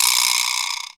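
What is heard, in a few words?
vibraslap; East West; Global Sounds; RY30 PERCS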